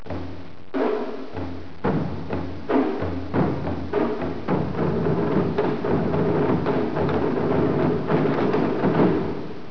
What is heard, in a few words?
drum